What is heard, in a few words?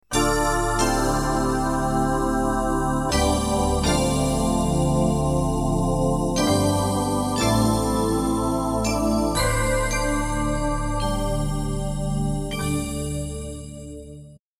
heaven